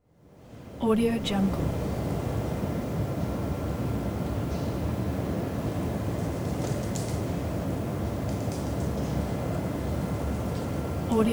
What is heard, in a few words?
early morning street